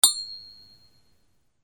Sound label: BANGS